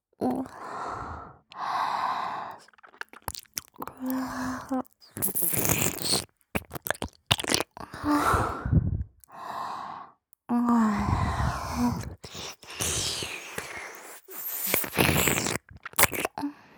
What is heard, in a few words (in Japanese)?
れぇろ…れるるる…, ASMR 左耳